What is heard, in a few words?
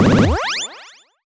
Block Delete; Ui Block Delete2